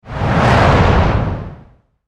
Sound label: Fire Fireball Flame; Flames